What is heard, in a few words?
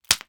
Belt Snap